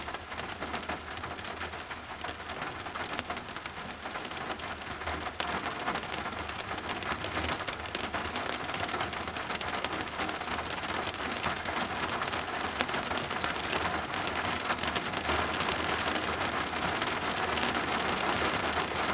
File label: Falling Rains